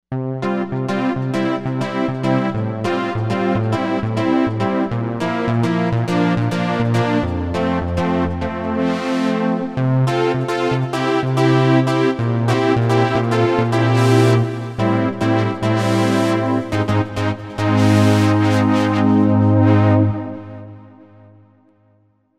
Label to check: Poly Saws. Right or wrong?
Right